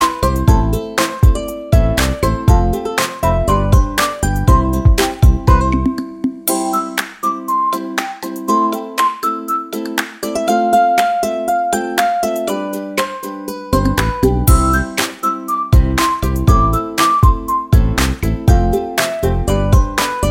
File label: Lively Fast-paced Advertising Entertainment